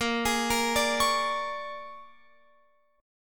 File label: Bb 7th Sharp 9th Bb, Guitar Open F; Bb7#9 arpeggiated; x,17,20,17,17,20